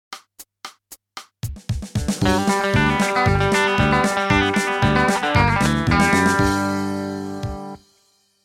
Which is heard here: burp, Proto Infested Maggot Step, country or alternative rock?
country